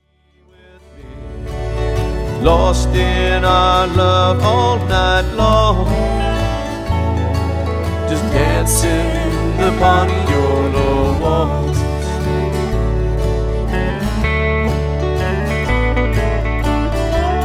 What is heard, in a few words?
Paniolo Waltz